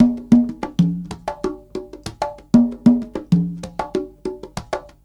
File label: Percussion, R&B Drums